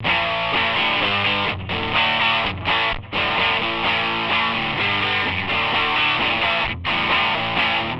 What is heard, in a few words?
dumble with distortion